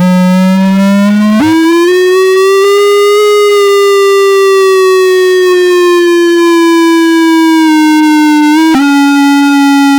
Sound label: close up riffle shuffle o, cards